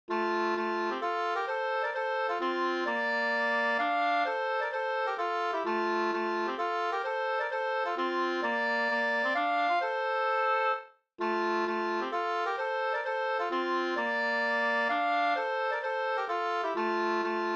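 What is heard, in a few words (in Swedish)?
polska, tvåstämmig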